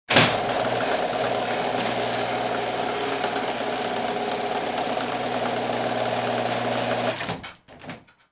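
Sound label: garage electric doors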